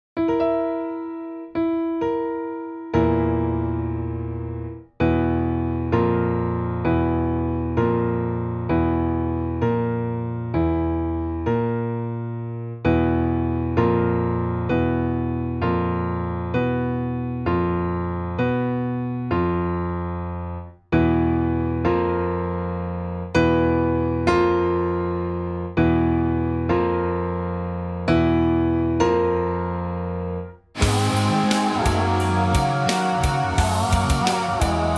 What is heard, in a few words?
music